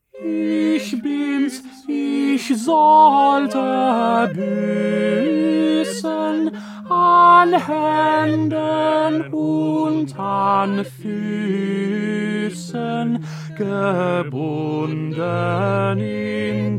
Tenor; Bach; St Matthew Passion

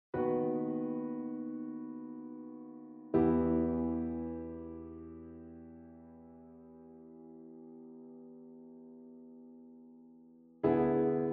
Instrumental; Haydn

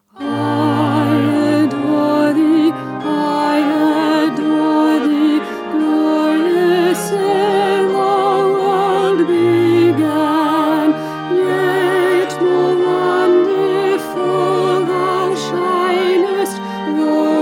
Alto; Stainer; Crucifixion

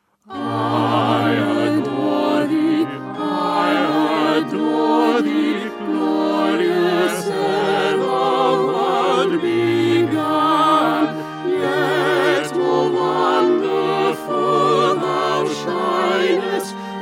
Concert Version

